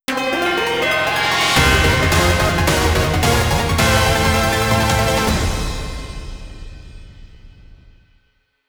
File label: Victory!; Rogue Mega Rayquaza; Pokémon Legends Z-A; Mega Dimension DLC; Switch 2